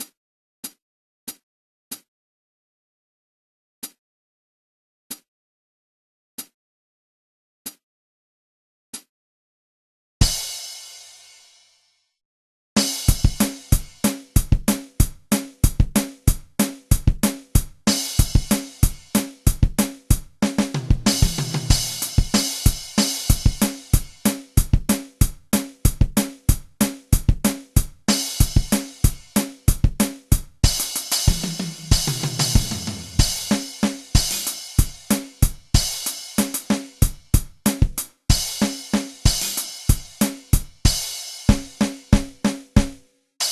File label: drum